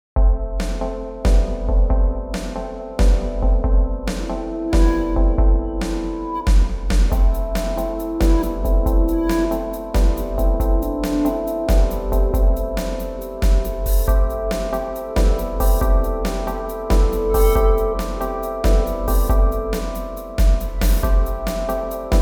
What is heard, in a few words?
groove